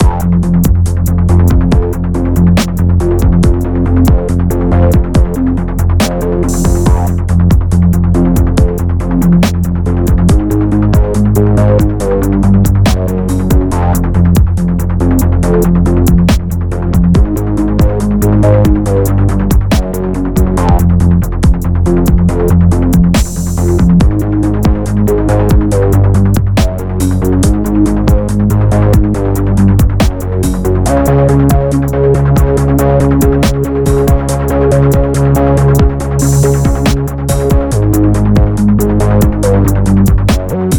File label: Tense; Everspace 2